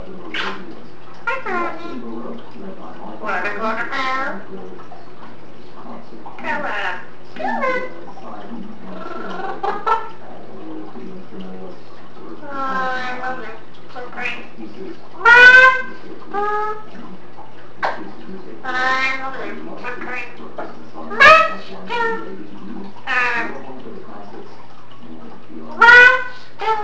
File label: babble monster, eclectus parrot